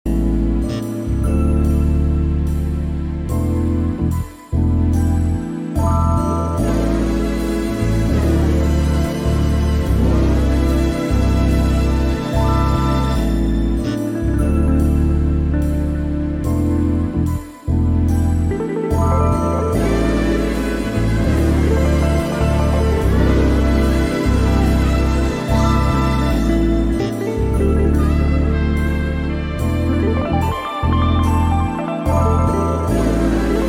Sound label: melody only